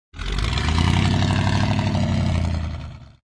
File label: Car